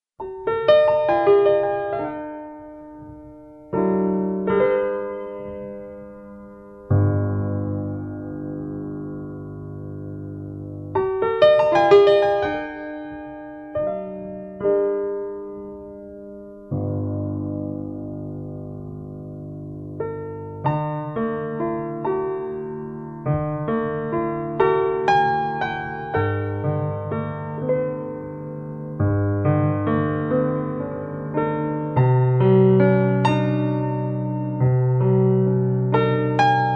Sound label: Instrumental; iL Mare; เพลงบรรเลง